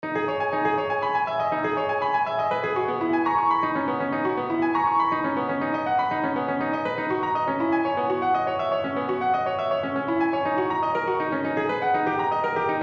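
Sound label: piano Preludes No. 24 in G minor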